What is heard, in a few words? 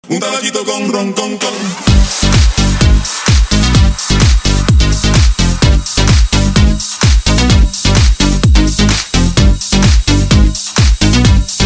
electro, ringtones, electronic